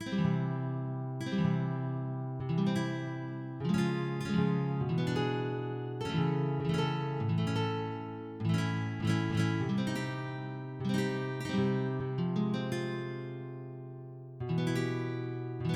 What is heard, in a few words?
Alfabeto and strumming; effects; MIDI